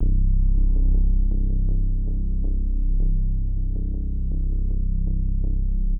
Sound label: sphere, ambient, 160; eJay